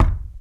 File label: Thump Big